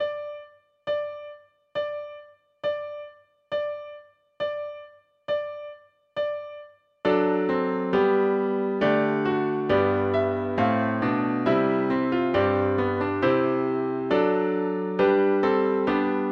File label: chorale for flute piano